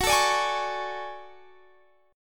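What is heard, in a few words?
D Major Sharp 11th G flat, chord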